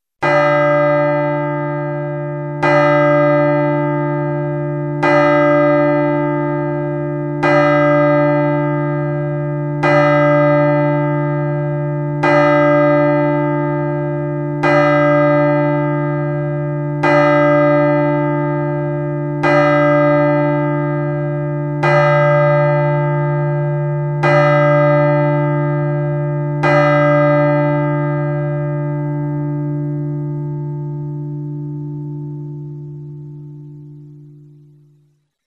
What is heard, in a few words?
Hour Strike; The Style E1002 Digital Chime system provides amplified audio output of a variety of digit